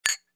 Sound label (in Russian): rjumki